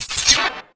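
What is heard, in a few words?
droid spider select; Star Wars Knights Of The Old Republic I; XBOX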